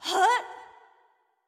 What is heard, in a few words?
Charge WindUp; Going Under; Jackie